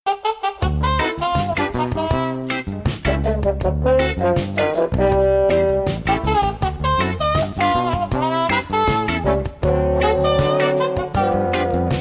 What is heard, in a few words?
cheerful tone; Jazz